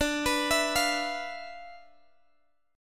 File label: D Minor 6th Add 9th D, Guitar, Open G